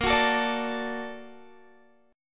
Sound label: Bb Augmented Major 7th Bb down Guitar; Bb+M7; strummed; 4E,4A,5D,5G,5C,6F